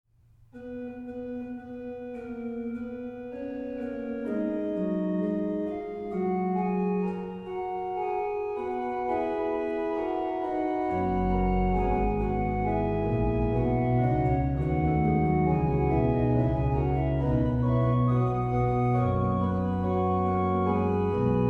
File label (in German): Das Jesulein soll doch mein Trost, Bach Organ Works Chorale Preludes; BWV 702; Individual Chorale Preludes